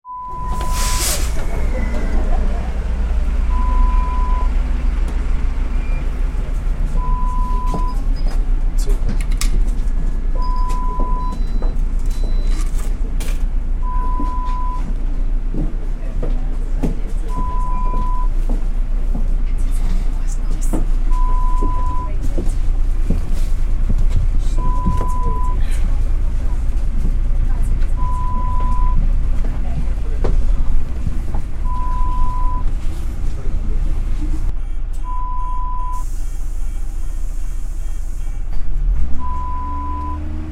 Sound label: Boarding a bus sound